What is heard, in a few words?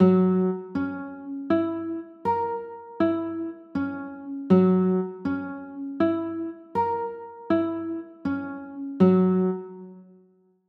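G flat 6 chord, guitar; g flat 7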